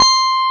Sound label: Korg CX3, C 1